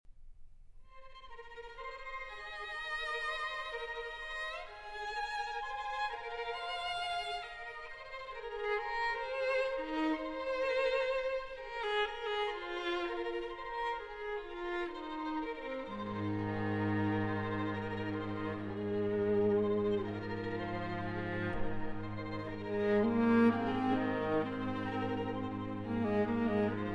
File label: string quartet; walter kaufmann; string quartet n 7